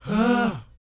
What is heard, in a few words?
BOYZ G#, Vocal Planet R&B & Dance; ILIO